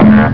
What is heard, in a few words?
bloink